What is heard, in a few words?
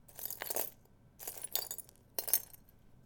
Glass Crunching; FXHome